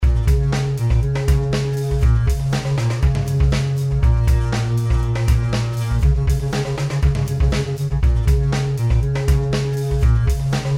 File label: ebow song